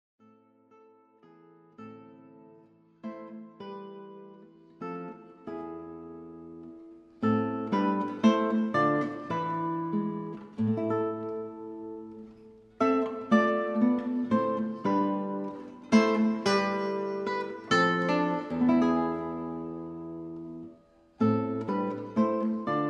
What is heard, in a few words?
Espagnol; Conventry